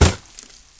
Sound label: sand, impact bullets sand; hl2